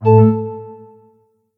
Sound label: closed Widget